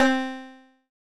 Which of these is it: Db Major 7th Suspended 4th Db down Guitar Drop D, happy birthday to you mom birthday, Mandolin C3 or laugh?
Mandolin C3